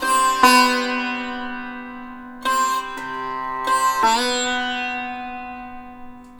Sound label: SITAR, Indian Traditions